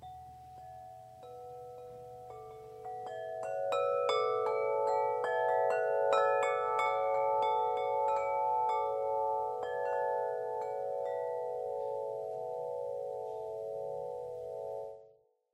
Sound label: 46in, metal wind chimes; music; Ultimate series; Hummer